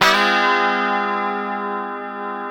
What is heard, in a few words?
F#3 D funk chord, guitars; Spectrasonics; Hans Zimmer Guitars VOL-1; 90's Sample CDs